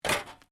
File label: METAL SCRAPS MOVE